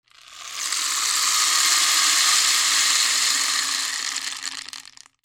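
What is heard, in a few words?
percussion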